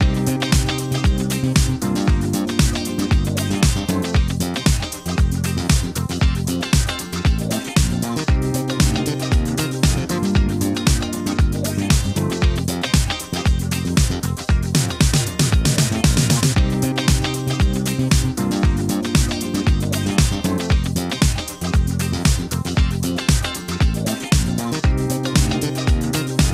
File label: Big Win Music Tally Loop; playboy Gold Desktop; Mahi Gaming